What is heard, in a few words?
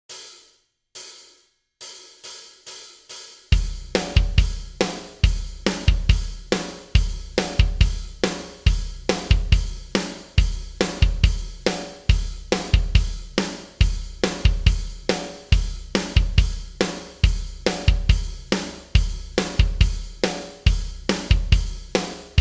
drum track 140 bpm; escala mayor; figura 6 de la escala mayor; sistema tres notas por cuerda